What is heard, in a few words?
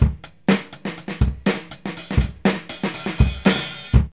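122 bpm, Funky loops; Zero-G; Funky Elements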